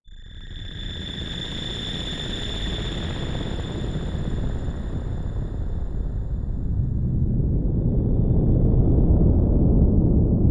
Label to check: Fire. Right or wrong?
Right